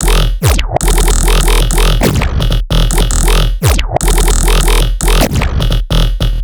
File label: Bass Loop E, Dubstep, 150bpm; Virus; Ghosthack; Dubstep Sound Arsenal